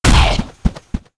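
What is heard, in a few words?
lava demon damage up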